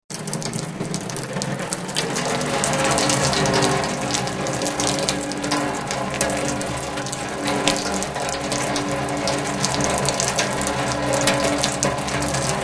dozhd po metallicheskoj bochke

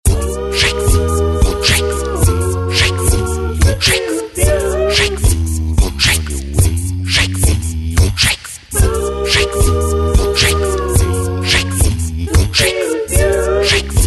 gruppa bitbokserov

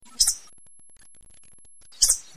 redkij pisk kotorij izdaet burunduk

